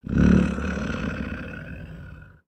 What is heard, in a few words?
Pets Lion Sleep; creatures; Lego